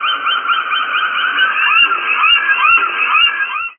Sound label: Gulls; Buggy; PS1